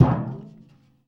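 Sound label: BIG TIN, percussion metallic; Rarefaction; Poke in The Ear With A Sharp Stick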